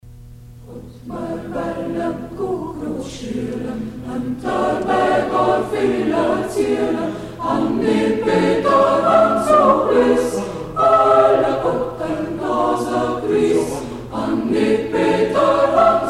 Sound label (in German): Madrigalchor; Hermannstadt; Kutt mer wälle Kukuruzschielen; Hegt wird gesangen